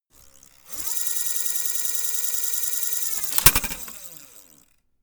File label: servo move high